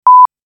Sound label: Blooper sound effect